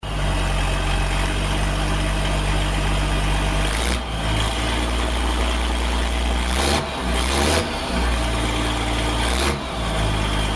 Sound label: Tractor